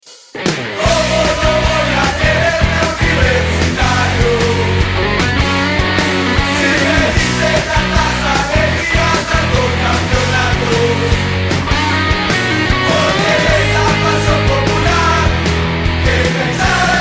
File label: Football Ringtone